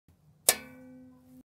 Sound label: Shovel; بیل زدن